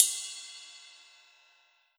Yamaha RM50 Ride Cymbal

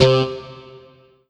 Korg N1R Rock Mutes C3

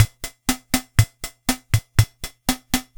Yamaha PSR-22 Rock N Roll

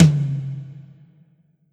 Roland JV-2080 Melodic Tom

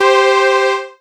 Casio CTK-611 Fifth Lead C5

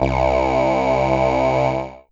Ensoniq ESQ-1 Air C2

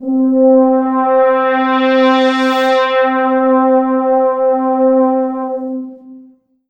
Korg NS5R Sweep Pad C4

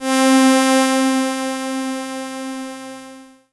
Casio MT-600 Synth Strings C4

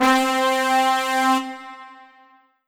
Yamaha EX5 Pop Brass C4

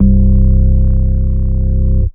Ensoniq VFX-SD Organ C4

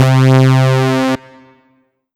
Korg NS5R Saw Wave C3

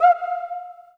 Kawai K11 High Cuica

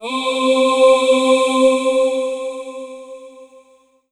Yamaha FS1r Choir C4

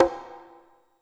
High Conga

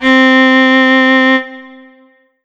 Roland SC-88 Viola C4

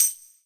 Roland SC-88 Tambourine

